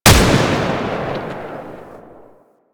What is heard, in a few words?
Target Practice Shot A; gameplay; Warframe; Nineteen Ninety Nine; Quincy